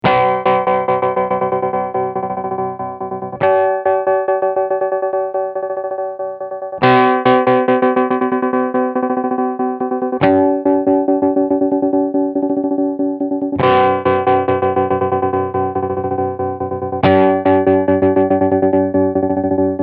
pattern trem; mobius

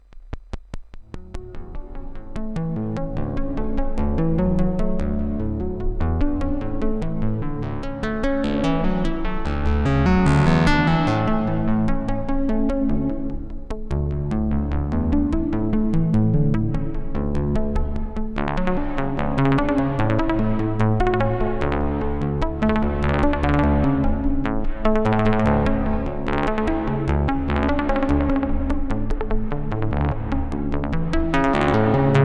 Karplus Strong Damping and Trigger; StarLab

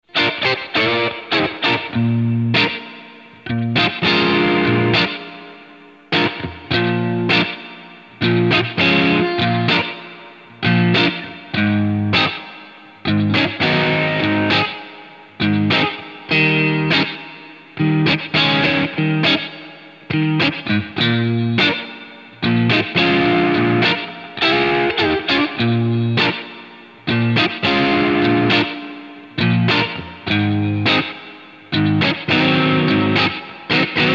Vintage Springs; blueSky

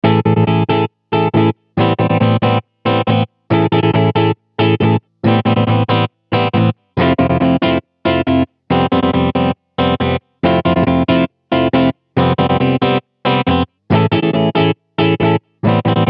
pattern trem, mobius

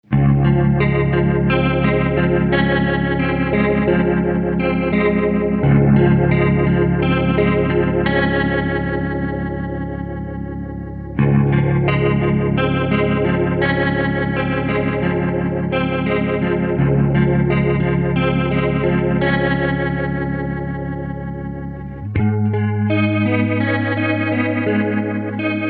rotary; Mobius

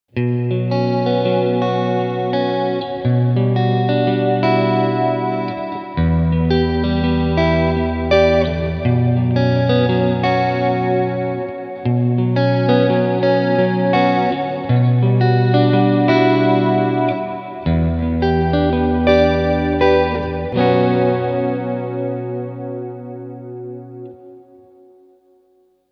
shimmer, big sky

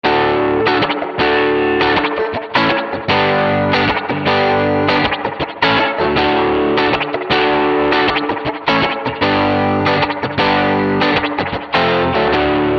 spring; BigSky; reverb; Cougar

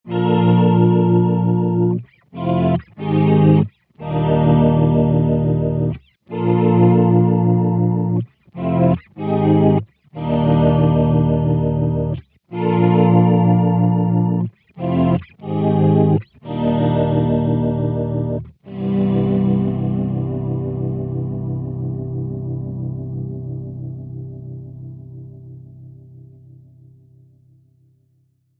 auto swell; mobius

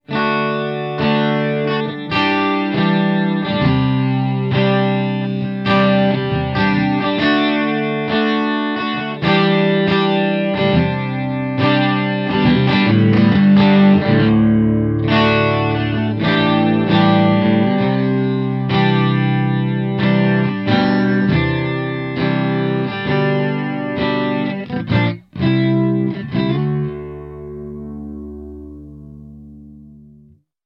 Flanged Shortness; Strymon Iridium → Strymon Deco; Tape

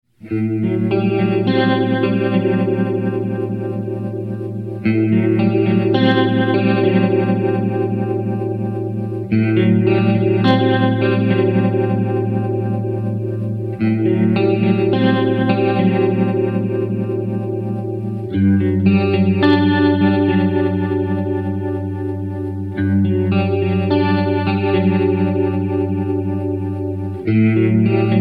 Fast Slow; Strymon Compadre → Strymon Iridium → Strymon Lex

